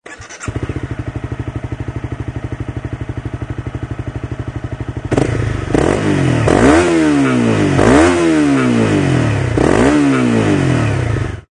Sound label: cbr250r